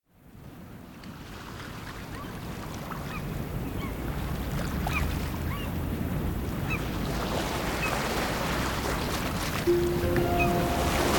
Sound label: Ocean Whisper; Huawei P30